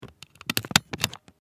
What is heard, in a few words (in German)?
office locher